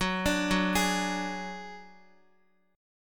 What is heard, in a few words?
Gb Suspended 2nd Sharp 5th, Guitar; arpeggiated; x,x,6,7,6,4; 4F,4A,5C,5G,5C,6E